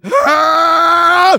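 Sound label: engineer pain critical death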